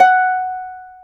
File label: HARP F#5; AKAI S-Series CD-ROM Sound Library VOL-2